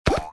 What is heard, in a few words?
Mortar attack